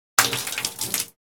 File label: Human spitting spit water into sink